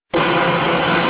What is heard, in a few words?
alert